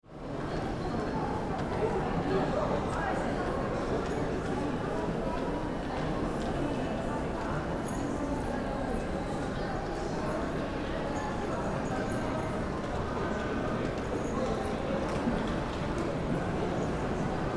station

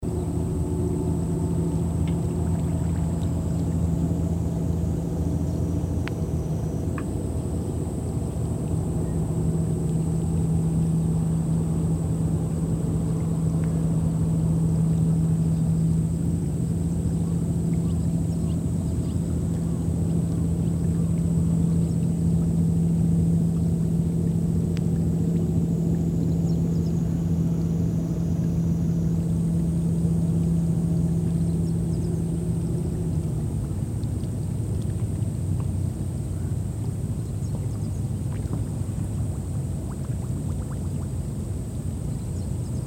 muddy flat

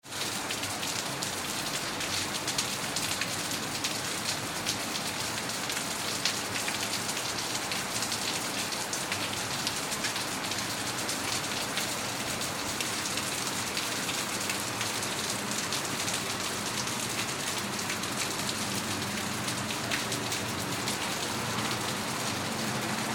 rain

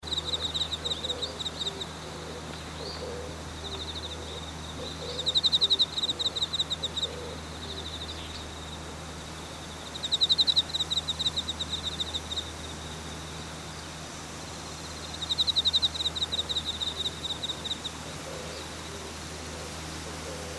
rice field

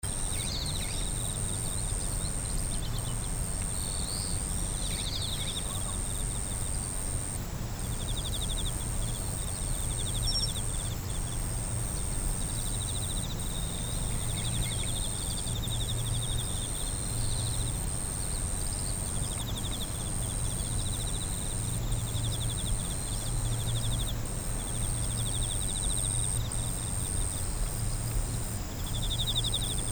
singing of insect

